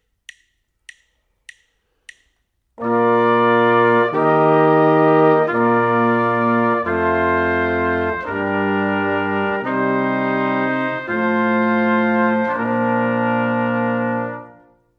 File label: harmonic error detection